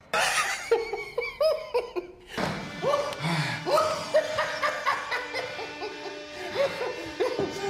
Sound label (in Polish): śmiech; Joker